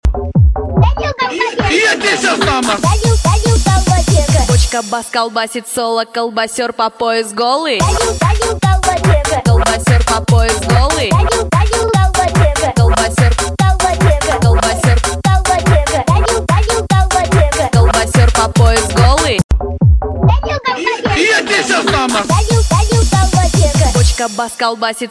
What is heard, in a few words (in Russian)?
bochka bass kolbaser